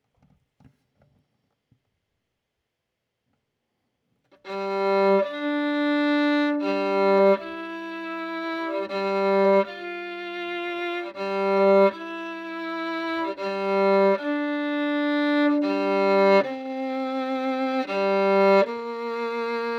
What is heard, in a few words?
Violin